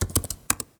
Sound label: Keyboard Presses; Realme 8